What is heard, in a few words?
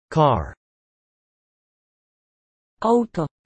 car